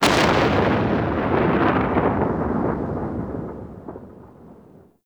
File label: thunder crack; Warframe; Tau